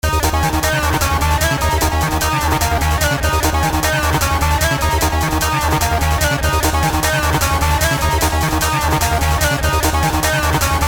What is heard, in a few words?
harmony, remix